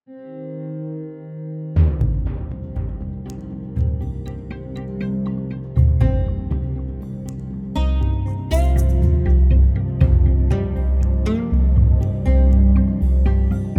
oud

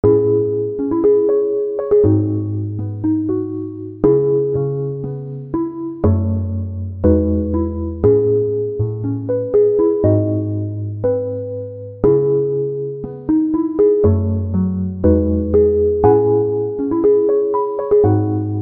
cembalet

